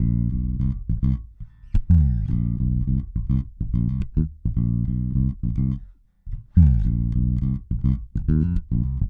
Bass Hip-Hop

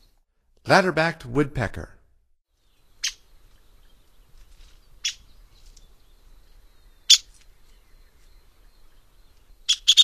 Ladder-backed Woodpecker; North America; Stokes

